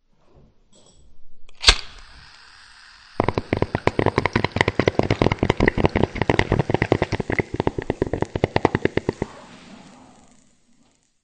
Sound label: bong